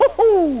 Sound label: doublejump; GAMECUBE; mario; SUPERMARIOSUNSHINE